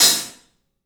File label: HAT, CLUBHAT, 3D Drums; 3D Sound